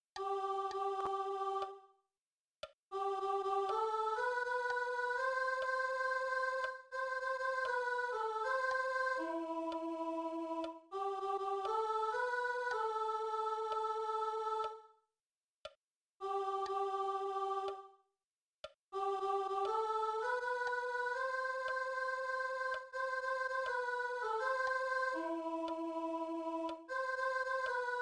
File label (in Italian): SOLO SOP